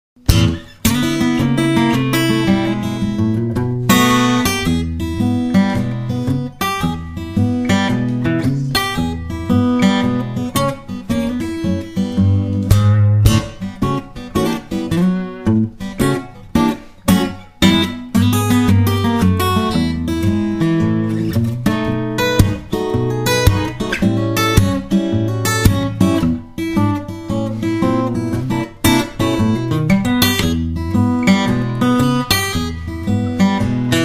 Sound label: country blues